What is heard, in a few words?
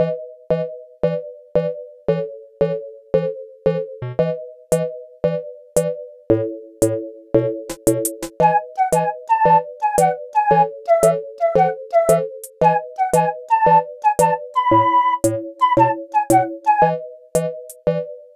Stroll, Aircheck, Hi-Fi